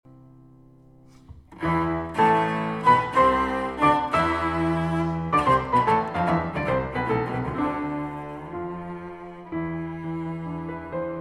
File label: Sonate C dur, cello; Beethoven